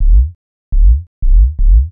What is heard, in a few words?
HOUSE BASS 125 A